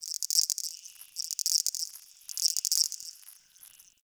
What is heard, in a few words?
cricket; Warframe; Tau